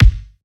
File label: kick, Drum One Shots; trex; SD MIDNIGHT Sample Pack; Slate